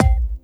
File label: BD, Dance Mega Drums, BD HOU; Best Service